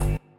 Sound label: step; tvhead; midnight guns; crt male